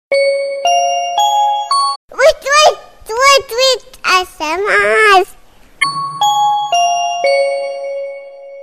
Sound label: Baby Talking Cute Tweet Tweet